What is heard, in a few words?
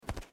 memory rollover; Boss Baby - Matching Pairs